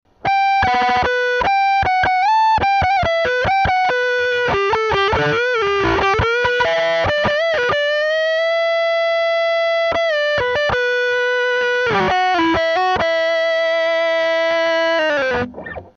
jackhammer lead

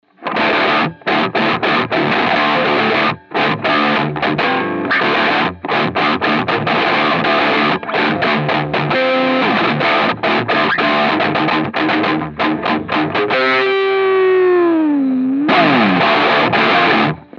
Distortus Maximus Gain

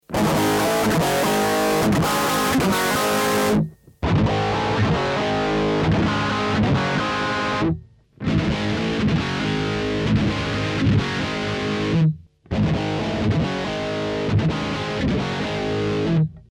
Hot Line dirty

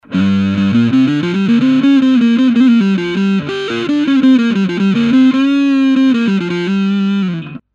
solo; tweako

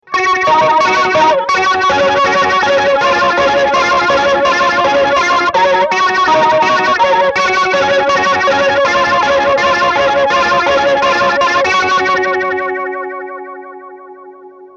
Flanger BF-2 Sound

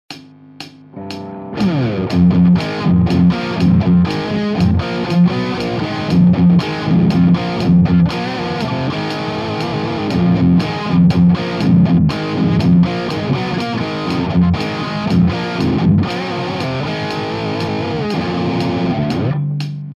simple rock

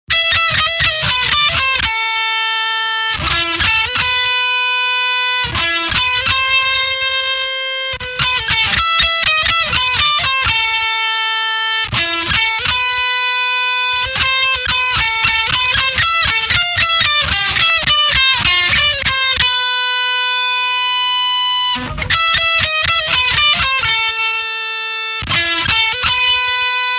High Gain Lead

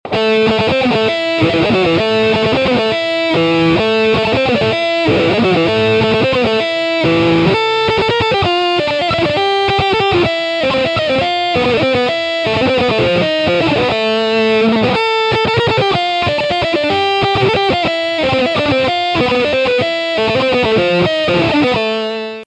jackhammer jcm900

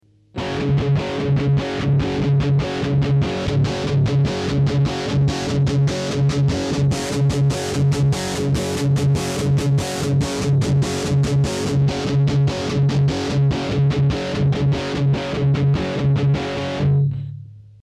drive; Hot Line SpSim

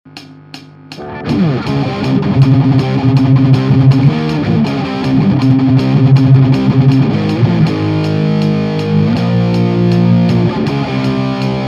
grind extreme metal

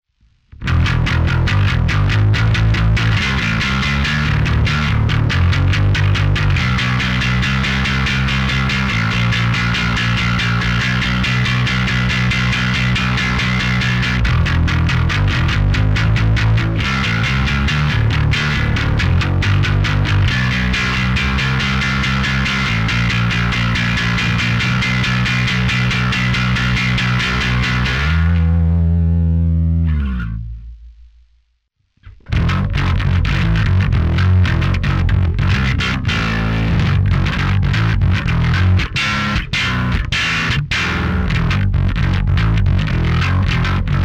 over maximum drive

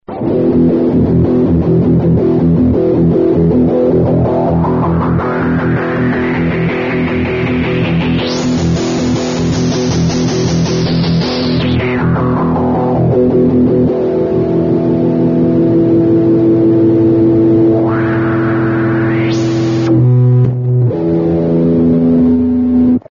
Morley PWA Wah dist

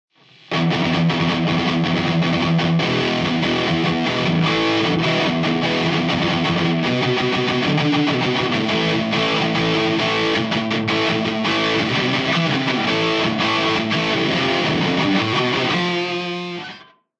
thrashmetal p muted

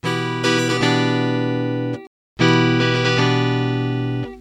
spksim amz clean

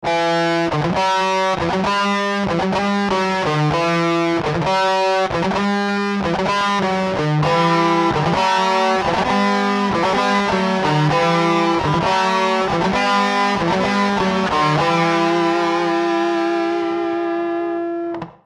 dist solo

